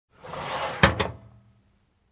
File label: Small sliding door close